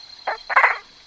Bokermannohyla napolii

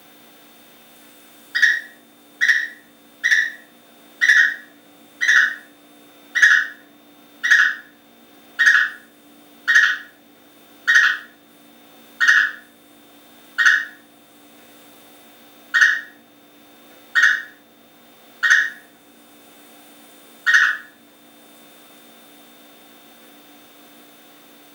Rhacophorus dennysi

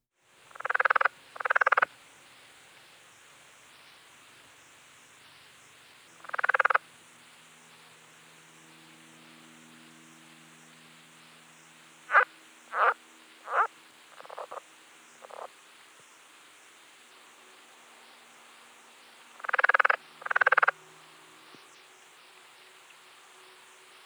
Rana berlandieri

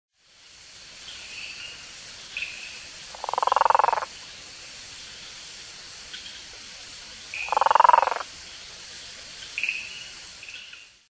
Mantidactylus guttulatus

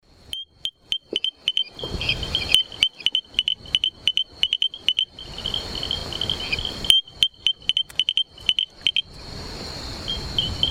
Boana caingua

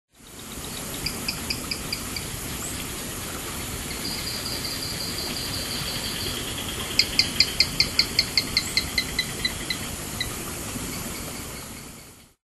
Mantidactylus argenteus

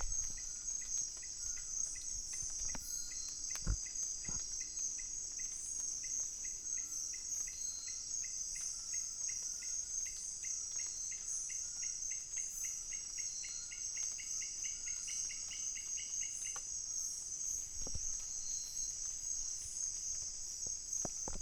Pulchrana baramica

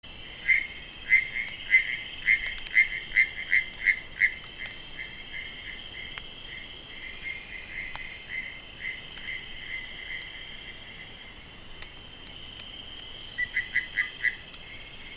Philautus vermiculatus

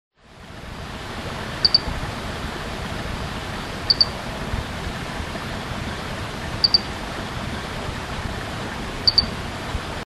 Mantella laevigata; CLIMBING, FOLOHY, CLIMBING, ARBOREAL, GREENBACK

